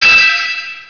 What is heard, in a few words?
tink, weapons; Hexen2